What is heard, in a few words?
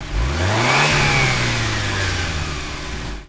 rev fade, Engines; E-MU Producer Series Vol. 3 – Hollywood Sound Effects; Ambient Sounds